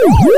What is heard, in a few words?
Eat Fruit